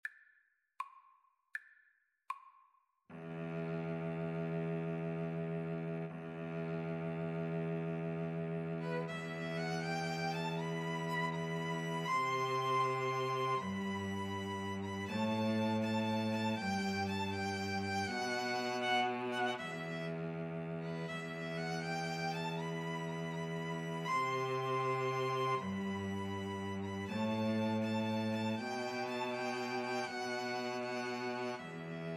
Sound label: 2 Violins Cello